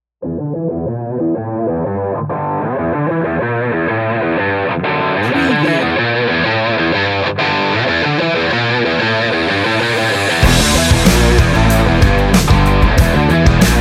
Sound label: drive